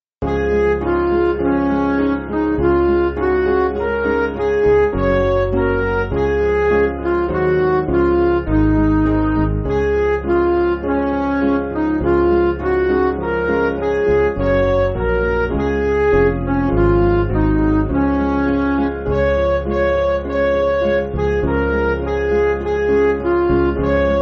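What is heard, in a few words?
Music Backing Files for Churches; Hark The Voice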